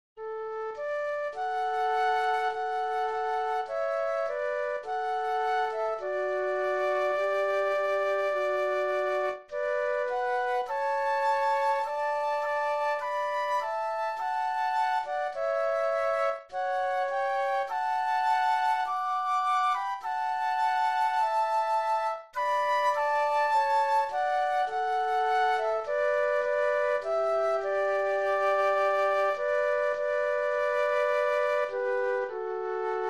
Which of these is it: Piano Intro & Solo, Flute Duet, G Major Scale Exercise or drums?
Flute Duet